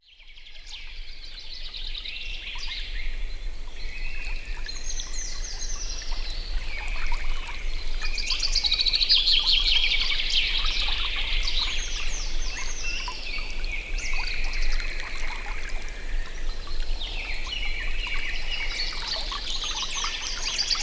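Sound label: in spring by a forest stream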